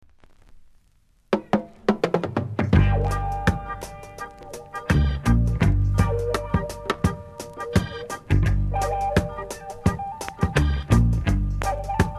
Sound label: REGGAE MUSIC